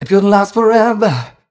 m 128 mel b-flat, Vocals

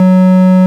ORG, Farfisa Clarinet

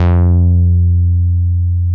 MOOG1 BASS F2

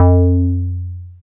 Bass SH-101

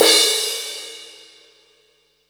crash R; Zero-G; Chemical Beats; CRASH ZOO

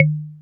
CLICK TOM; Back In Time Records; Korg Universe VOL-1; DRUM FAMILY

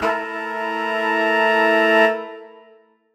Horn Swell Amin9; undercover samples

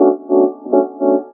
Rhodes; Zero-G Jungle Warfare 1, 2 & 3; 90 s Sample CDs

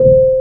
BOX ECHO C3, MUSIC BOX; AKAI S6000 CD-ROM - Volume 1

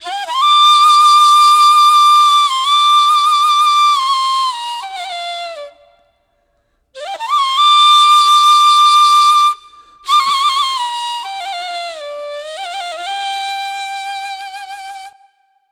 Ney, Wind Instruments; Sonic Foundry (Sony Creative Software); World Pop

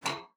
PLOK G5, SPINET; Xsample VOL-7

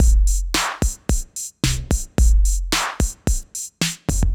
Eighties, 110bpm, 80s heat samples; musicradar

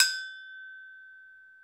Brzl Agogo; Club-50 - Foundations Roland

